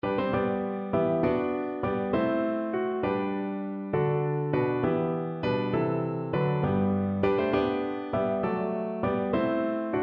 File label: Listen to my Story, piano